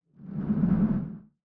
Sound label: Swoosh Transition